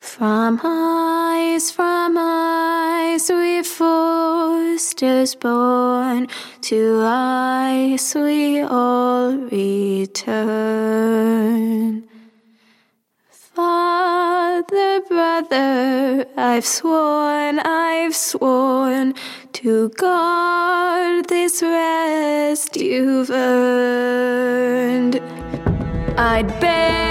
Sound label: Funeral Ballad; Game of Thrones: A Telltale Games Series; Linear Forrester Funeral Ballad Lord